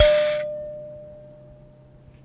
Finger, Prepared Kit; Battery 3 Sample Kits

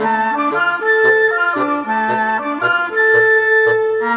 Winds, Orchestral, Rock Pop

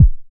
BD, Synth; Sound & Vision - Gigapack II CD 2

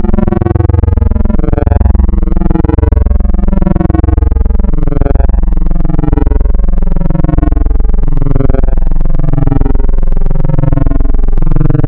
Spectral, wavetables; Architecture 32

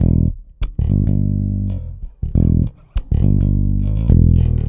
Rock, Bass; Bass X

